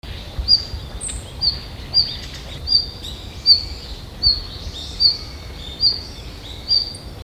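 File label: ruribitaki, red-flanked bluetail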